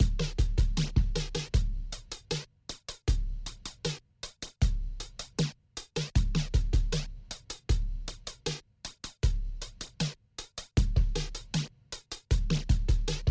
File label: bass and drum; weeklybeats-2018